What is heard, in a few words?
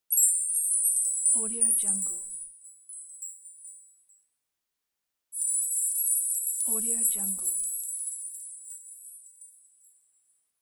crystal shine; n-audio